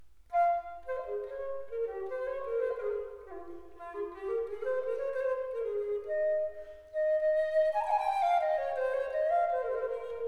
Prelude in g mineur, Flute Traversiere

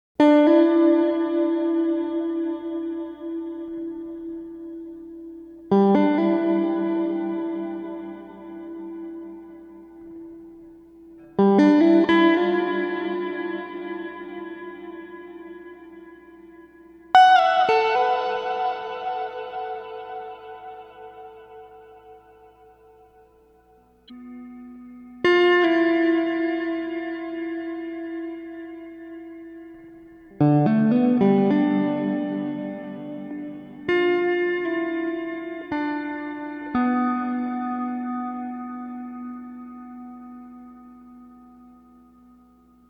Solo Guitar With Tin Foil

